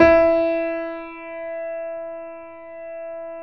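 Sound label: grand piano E 3; USB Soundscan; AKAI; Keyboards Acoustic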